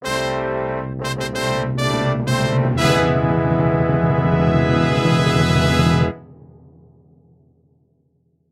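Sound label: sounds of fanfares and solemn award ceremony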